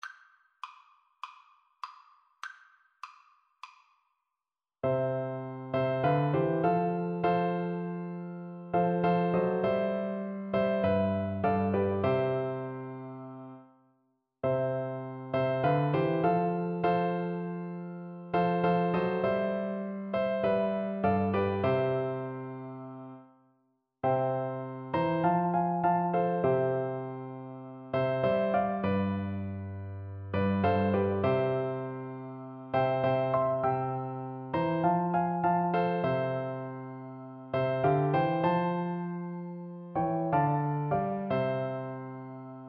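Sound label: recorder karaoke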